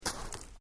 Footsteps Boots Snow Shallow Step

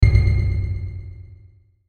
Hit Ping Delay; Pings; Blastwave

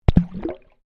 Water Microphone Dunk Shallow Submerged

